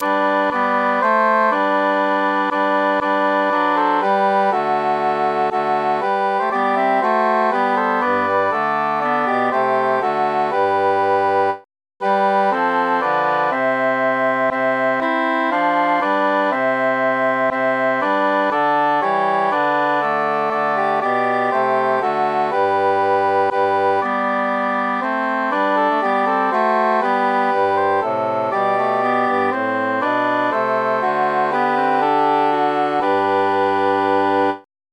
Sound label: altus mute; sing we and chant it